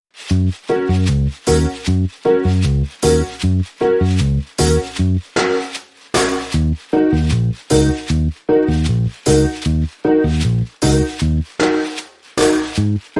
Reggae Riddim

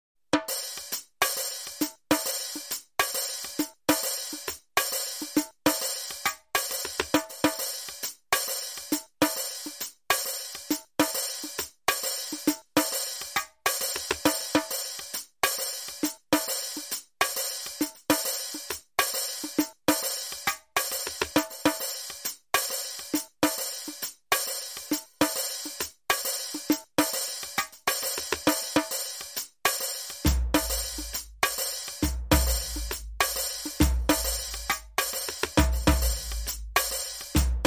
Fast Burlesque Loop

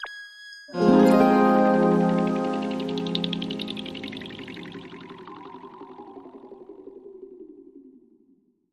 Sting

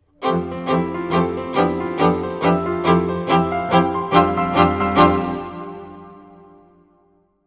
Soaring Waltz, Sting

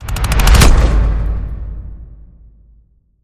Precision Fire Automatic Hit

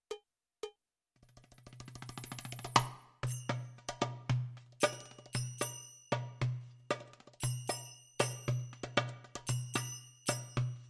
Arabic Loop

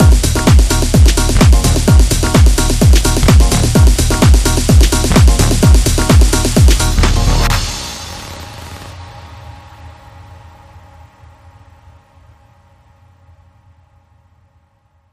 Club Bad Sting

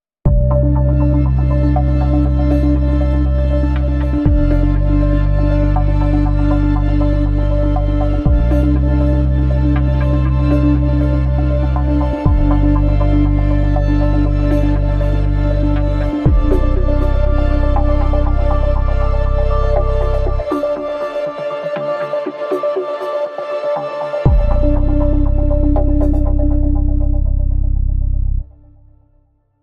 No Perc

